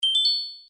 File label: coin